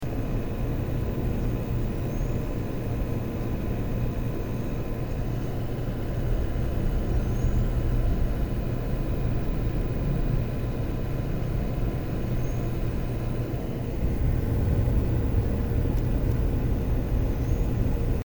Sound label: Power Sound